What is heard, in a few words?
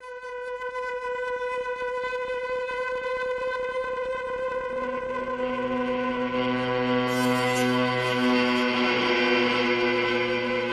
GTR INTRO; transformers war for cybertron gamerip